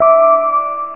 Match; Jewelbox 1.0; Match 6

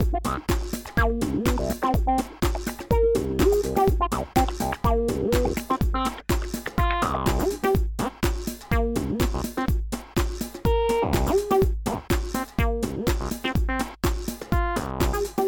Acid Funk

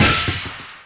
armor pickup; PC Gamer IT CD 41; Daikatana; items

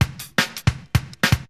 DRUMLOOP D+B 160, Drum+Bass Loop; eJay

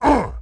ogre hit; DAoC; Toa; Gamestar

